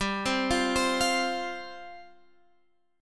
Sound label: F Suspended 2nd G